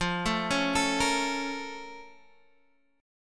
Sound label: Bb Minor Major 7th